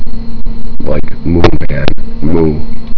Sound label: moo, guy; hall of moos